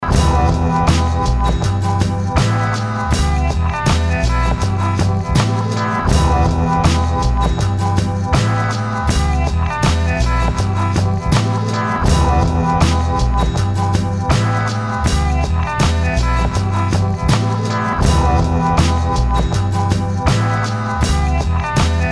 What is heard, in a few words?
cowboy beat